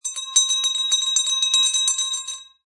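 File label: Hand Bell Multi Jingle, Ringing bell, hand bell shaken several times; Bells (Sound Effects:Musical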